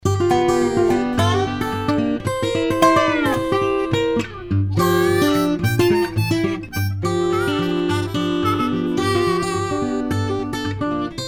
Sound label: Acoustic and Dobro